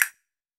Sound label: CASTANETS